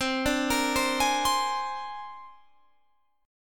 C 7th Suspended 2nd Sharp 5th, C, down, Guitar, Standard; arpeggiated; chord; C7sus2#5